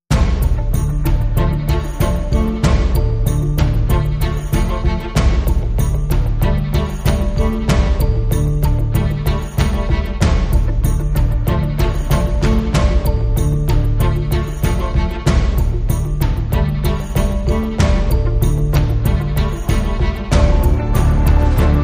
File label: Less Strings